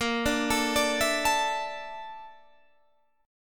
A sharp Major 7th Flat 5th

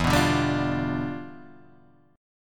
E Minor 7th Flat 9th E

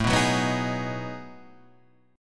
B 13th A, chord

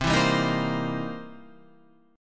C 7th Sharp 9th C Guitar; strummed; JGuitar

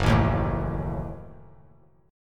Gb Minor 6th; strummed; JGuitar

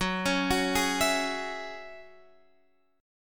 Gb 7th Suspended 2nd Gb

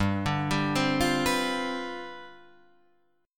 G 11th G, chord

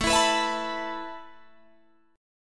A Suspended 2nd A Guitar Open G

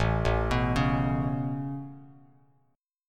A Major Add 9th A Bass Standard, chord; Aadd9 arpeggiated; JGuitar

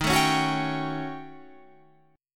D sharp 7th Sharp 9th Flat 5th

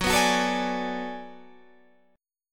B Minor 13th Gb

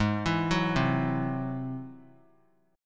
G sharp Augmented 7th G sharp, down; arpeggiated; 3C,3F,3A,3D; JGuitar

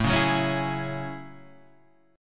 Gb Minor 9th

